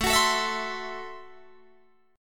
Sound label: A Minor 6th A Guitar DADGAD